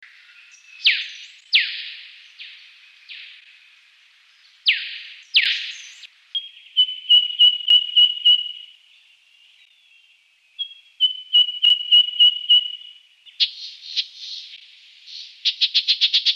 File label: eastern Yellow Robin; robins; canberra garden birds